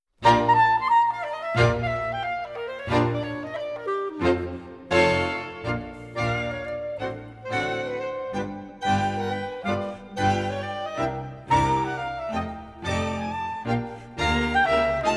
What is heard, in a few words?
charleston medley